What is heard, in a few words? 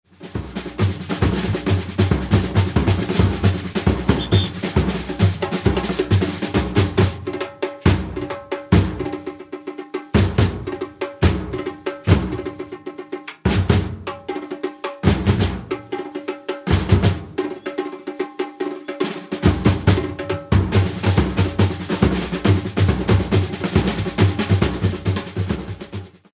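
Break up, Batucada samba; מעבר 5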